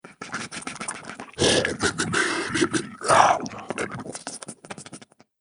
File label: A Goblin Dinner Party, Monster